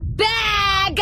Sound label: bag